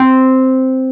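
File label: Wurlitzer C6, Keyboards Old School; USB Soundscan vol.09 - Keyboards Old School; AKAI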